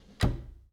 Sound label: Foosball Center Slide